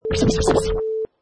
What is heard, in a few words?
DJ Scratch